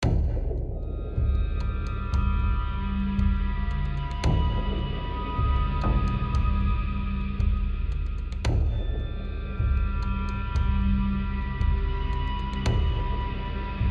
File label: Tension High Chunk; The Last of Us